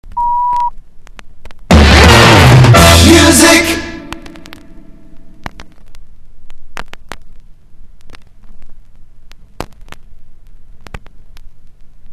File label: jingle single; topformat maxi jingle single 2